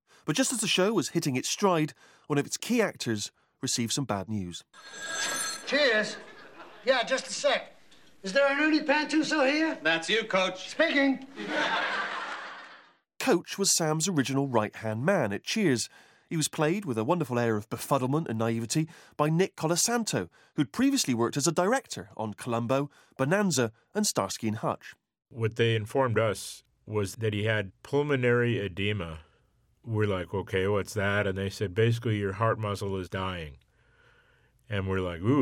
raising a glass to cheers

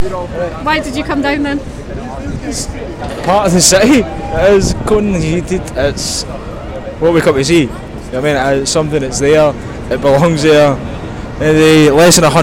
vox chant

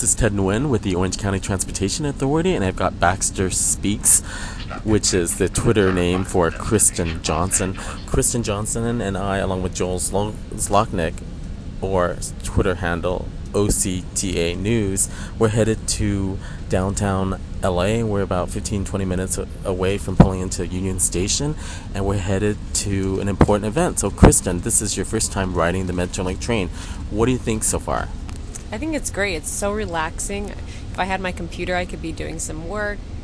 taking metrolink train; for high speed rail news conference; cahsr

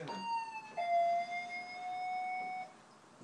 comedy doorbell

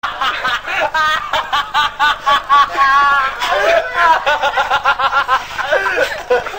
laugh; gary barlow

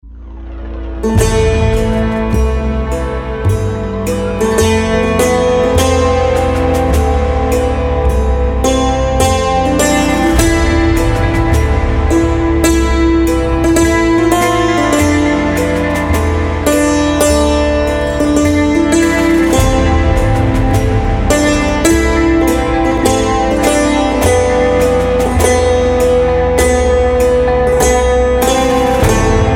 dulcimer

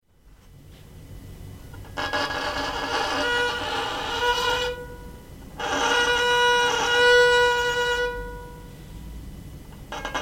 violin

